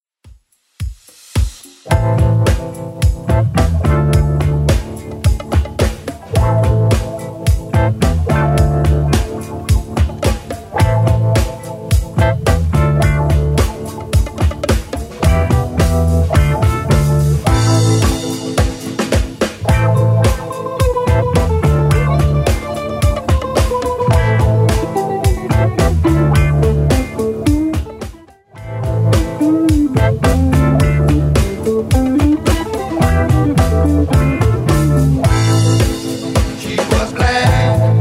70s Redrum 108 bpm CLEAN

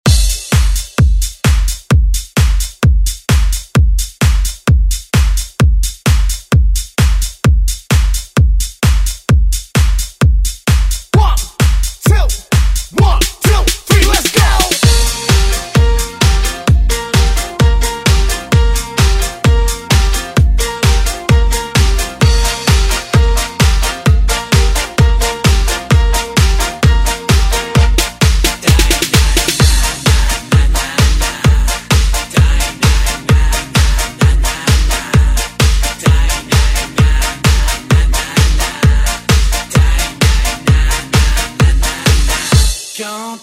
oldschool; Deejay Hit; Redrum

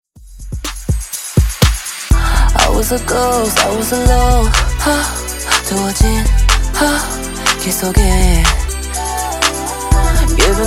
Intro Outro 123bpm

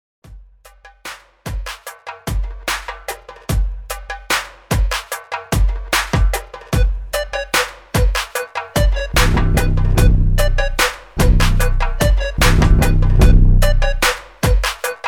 74 BPM

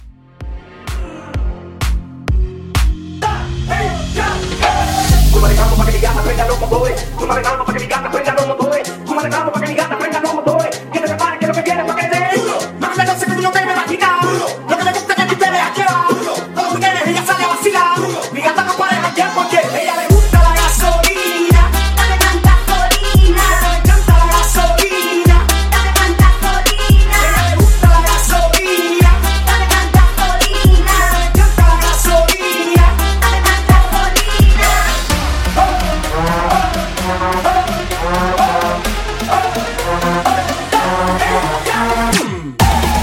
Hybrid Edit 128

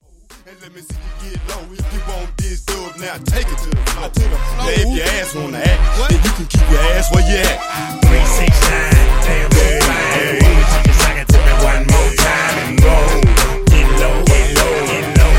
Hip Hop RB Redrum 101Bpm; Remixfreakz

